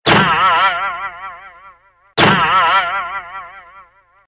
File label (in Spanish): Sonido Muelle Salto